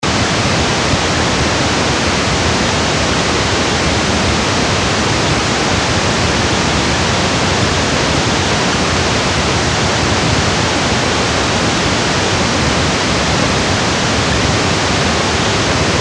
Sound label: Pink Noise Bandlimited